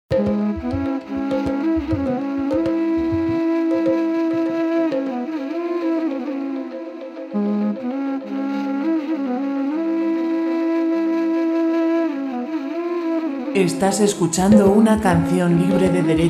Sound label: folk etnic